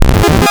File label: Falling; Synthetic's Serum Collection; Void Serum Bank - Tables